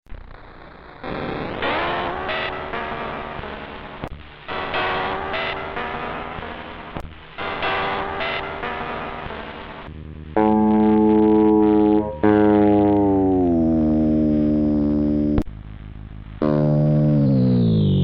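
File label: guitar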